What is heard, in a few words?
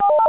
Computer sound; Final Frontier